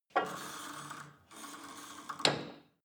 cable machine old pull and drop soft, fitnessstudio